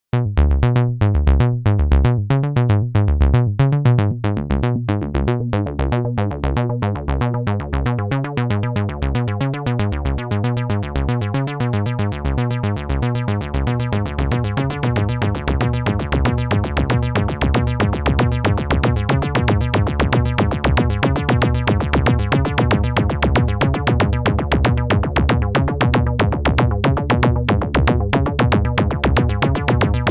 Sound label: Moog Sound Studio Patch Cyber Arp Mother 32 DFAM